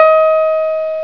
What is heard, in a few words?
synthe; cstrike; wildwest